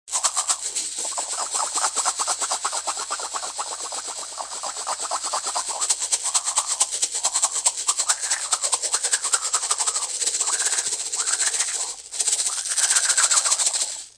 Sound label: shakers; LP